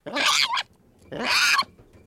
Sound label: Baboon Scream Shrt X2